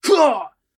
miner attack

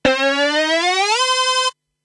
Se Charge; Kairobotica

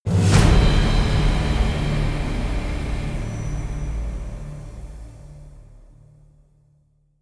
String; Assassin's Creed Unity

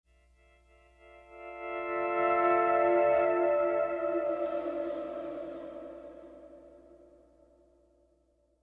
Pleasant Guitar

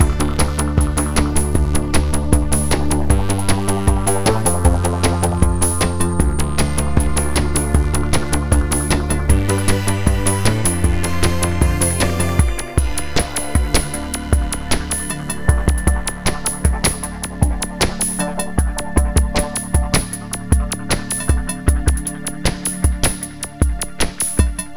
music

